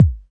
Pearl SC-40 Kicks; DRUM MACHINES

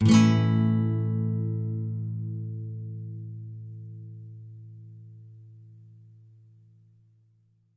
Nylon Chord A maj, Guitar; Nylon Guitar Chords

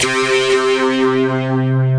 Synthesizer Middle C Notes, Lead

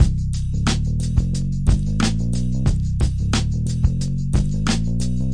090 baixo louco humble bass; SAMPLED MUSIC LOOPS

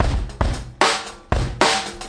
113 hard clangy funkrock, drum loops and breaks

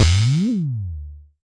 Jomox MBrane, drum machines; Jomox MBrane 11

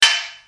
soft cym; Cymbals; single hits

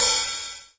RIDE JAZZ, Electro Soul Kit; STYLE KITS (Hip Hop, Etc)

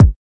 kik

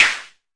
mbrute sn, Arturia MiniBrute; DRUM MACHINES

